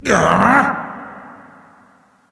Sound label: nv confused; Smite; awilix